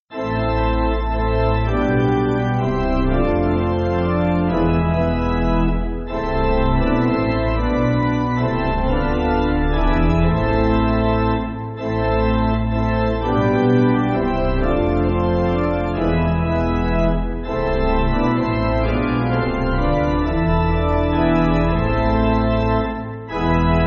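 Pipe, Pipe Organ, Music Backing Files for Churches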